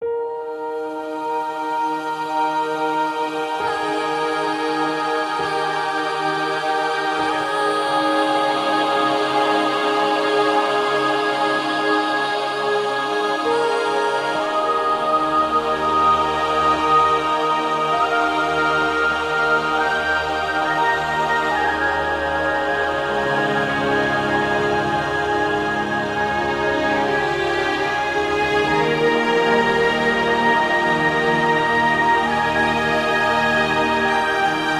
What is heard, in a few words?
Ambient Meets Classical Music; relaxing